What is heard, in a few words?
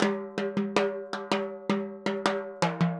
Timbali, Latin Percussion